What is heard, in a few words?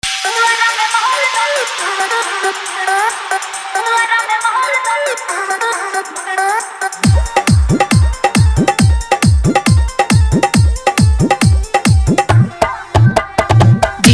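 New Song Hard Dholki Bass Mix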